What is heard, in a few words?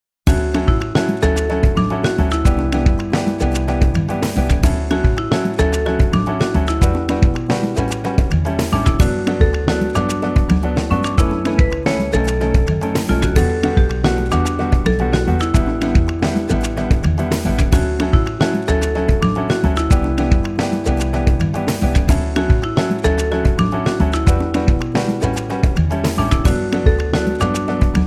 Bright Latin